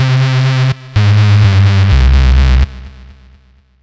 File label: bpm